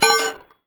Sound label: SMASH, Anime Sound FX; COMIC